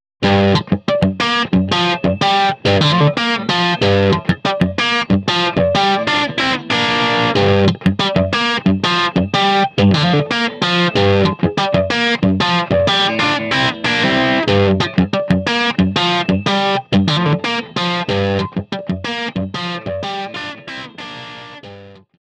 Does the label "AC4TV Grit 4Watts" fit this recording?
Yes